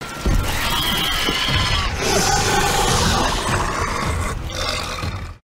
Trapdoor Crab roar

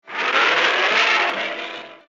Dragon king roar

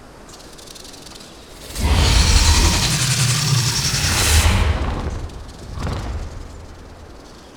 Young roar; Warbat

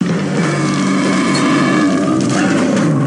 Large Giant Rat; Godzilla The Series

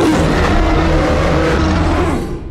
Roar; Kong 2021

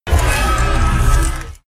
Alien ship roar

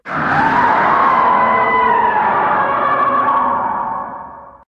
Roar; Gamera; Heisei

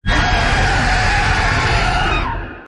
roar; Kibo

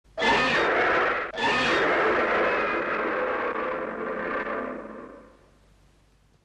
Bat-men roar

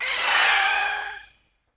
Larva SFX; Mothra Leo

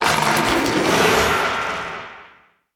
roar; Skorak

